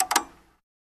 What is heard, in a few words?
Flasher Lights Click, emergency vehicles; Flasher Lights Clicking on Emergency Vehicles